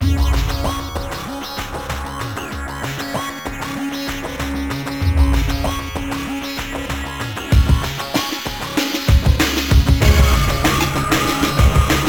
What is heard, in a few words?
Music Level Boss; Warframe; Caliber Chicks Minigame